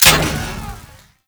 Concussion Rifle Empty Fire; Warframe; Grineer

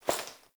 Body Move; Frumentarius; Warframe; Ambience; Nineteen Ninety Nine

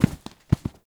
Foam Block Impact; Warframe; Ambience; NineteenNinetyNine

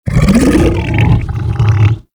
Helminth Feed React Neutral; Liset Alchemy Lab

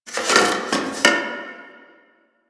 Box Of Trash Rustle

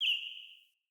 Bird Single Chirp; Josalfar Bird

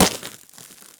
Glass Bullet Impact; Ambience; Props; Warframe; Nineteen Ninety Nine

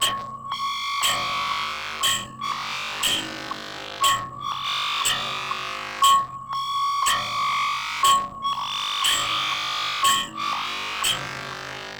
Security Credentials Pick Up Loop; Shadowgrapher

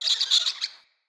Squirrel Freed Call; Encounters; Animal Rescue

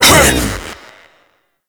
Ape Prelate Attack Short, Vocals; Warframe; Proto Infested

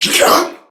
Surprised Crewmen; Dialog; Barks; Battle; Warframe; CorpusCrewmen; CrewmanB

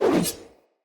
Dagger Whoosh Heavy, Swords; Weapons; Player; Josalfar

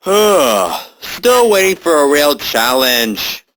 Barks Arcade Machine; Warframe; Mall

